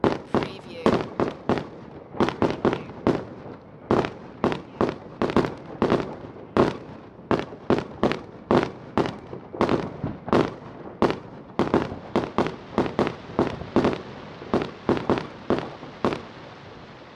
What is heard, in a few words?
New Year Fireworks; beanstalk audio